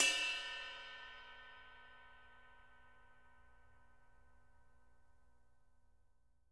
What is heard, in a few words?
JZ RIDES, Living Drums